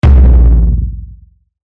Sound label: Hit Big Bass Rumble; Blastwave